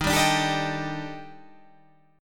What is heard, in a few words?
D sharp Major 7th Flat 5th, down, chord